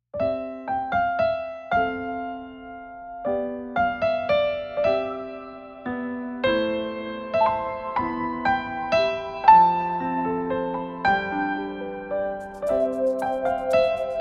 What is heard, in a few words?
for strings bells and piano four hands; magic christmas